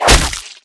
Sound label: arrow impact flesh